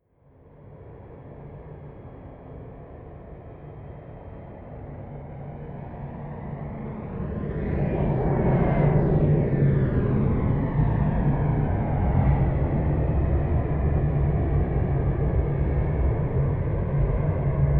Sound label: plane cessna private flyby right to left distant; flugzeuge verkehrsflugzeuge; verkehr transport